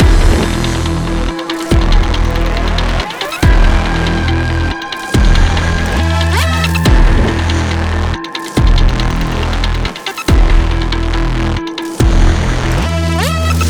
Church Action; Evacuation

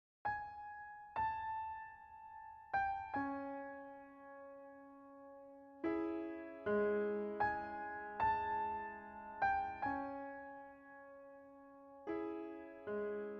Cryptic Sorrow

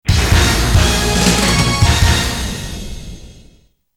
Stage Clear; dead or alive for playstation

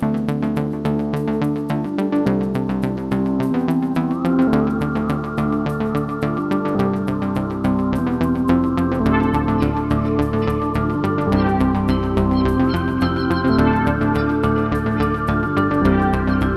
Music Room Silence

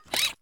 Meca; Puddle; puddle plus ios gamerip 2018

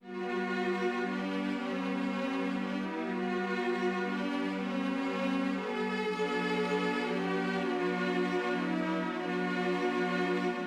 Calm; Orphen: Scion of Sorcery; PS2

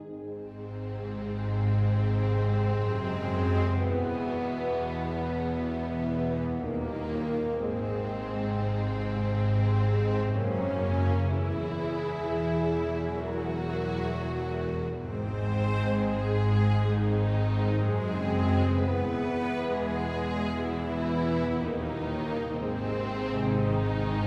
Music Segments Walk Majestic 64bpm Fadein Loop; asterix obelix slap them all 2

